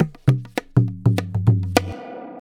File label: GOLA, tabla, 100BPM; Pure Tabla; Zero-G